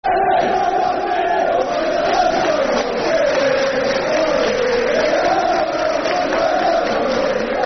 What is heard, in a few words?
When The Blues Go Marching In [Football Chant (Soccer Songs)], Leicester; fanchants; Leicester City Lcfc